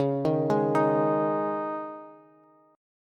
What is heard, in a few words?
C sharp Suspended 2nd, Bass; arpeggiated; 21,18,18,20